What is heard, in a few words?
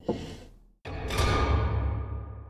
Cellar Door Wrong